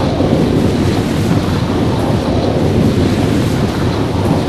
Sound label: lead ball fly